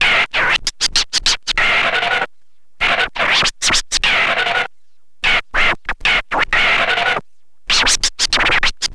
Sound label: scratch med, Vinyl Attack scratches mix; Masterbits